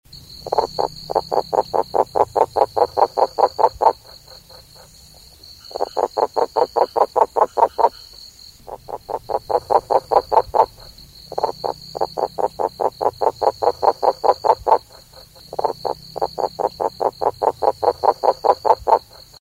kuhlis wart frog

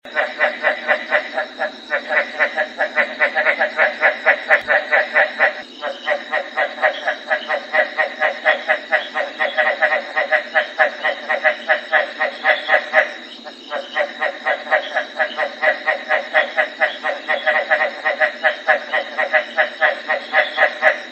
chinese bullfrog

